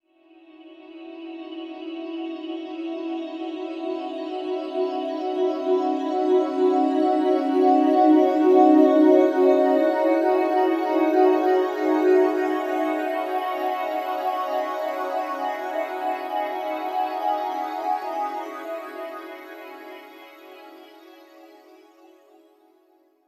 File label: SOFT GLOWING WAVE, SLOW SYNTHESIZER WAVES